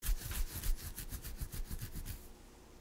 Head Scratch

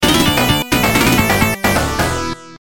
Level Complete

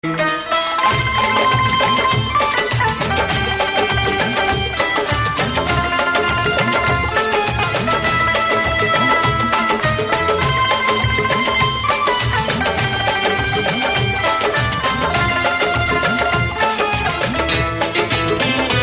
Banjo Music; Karma

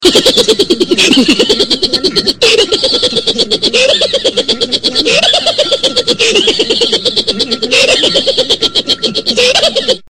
Laugh; Zoo Zoo

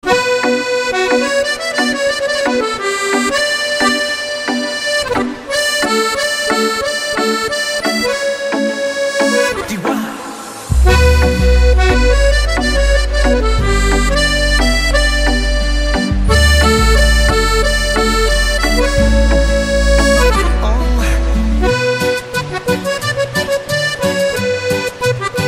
acordion

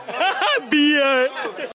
Haha; Bier

